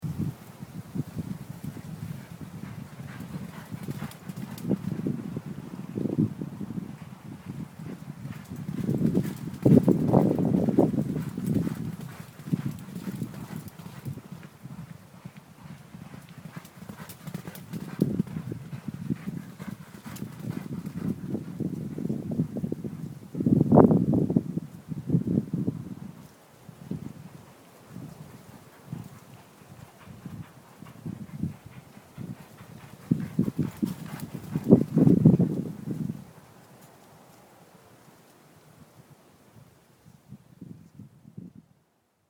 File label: horses